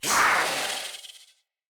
Kavat Vocal Attack Medium; Warframes; Khora

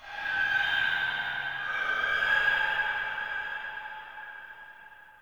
Interior Texture High Screech Calls; Ambience; Indoor; Warframe; Infested Eris

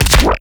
Weapon Punch Shotgun; Warframe; Weapons; Shotguns

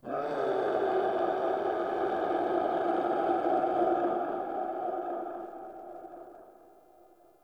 Ext Chatter Painful Scream; Ambience; Misc Outdoor; Warframe; Infested Eris

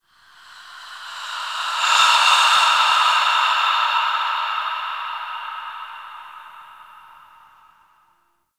Breaths, Ambience; Warframe; TheNewWar; Zariman

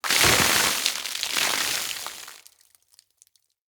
Water Barrel Ground Splash

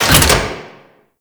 Infested Metal Clunk; Warframe; Corpus

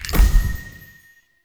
UI Focus Rank Up Tree

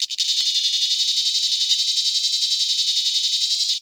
Meadow Close Grasshopper; Ambience; Day Animals

